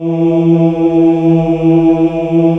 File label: male voice E2; AKAI S-Series CD-ROM Sound Library VOL-2